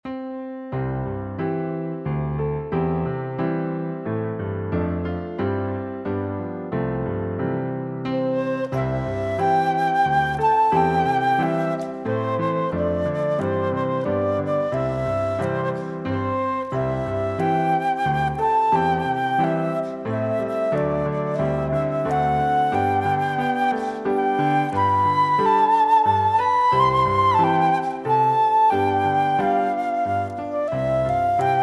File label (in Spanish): Pista con melodia instrumental